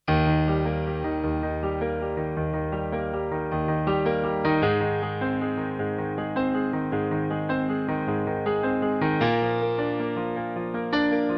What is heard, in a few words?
piano